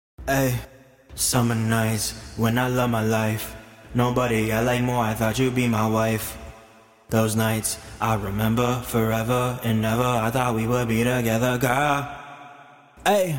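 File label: hip hop, 85, F minor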